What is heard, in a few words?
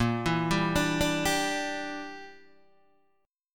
Bb 6th Flat 5th Bb, Guitar DADGAD